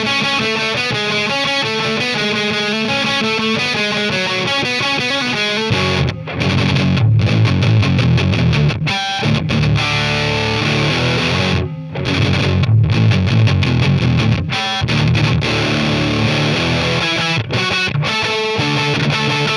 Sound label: RX Loud